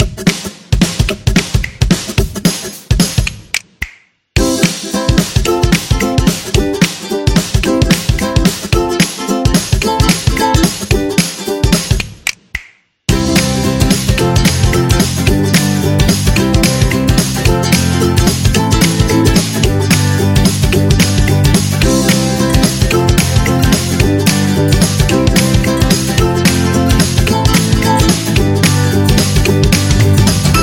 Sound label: Food Happy